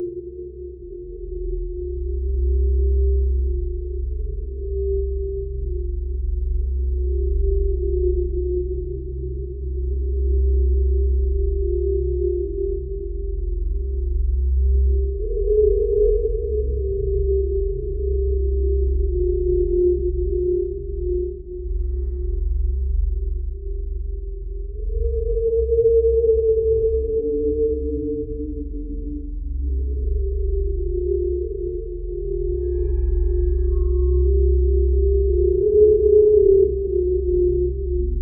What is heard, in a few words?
horror ambient deep quiet